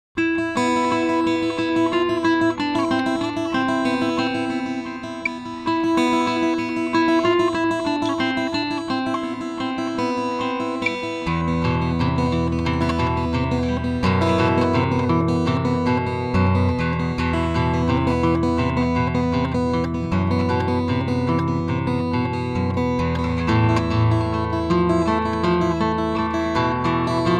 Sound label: yamaha fs-32rbd1, guitar